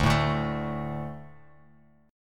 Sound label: D 5th D, down, Bass Standard; D5 strummed; JGuitar